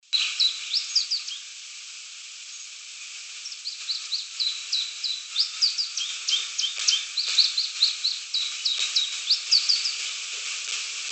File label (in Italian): Luì Piccolo